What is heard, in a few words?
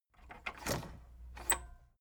tape deck open